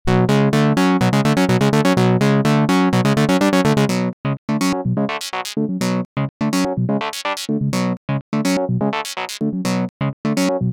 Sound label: Arpeggiated Synth; Array